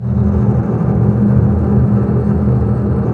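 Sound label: double bass tremolo; Roland L-CD702; Cbs FX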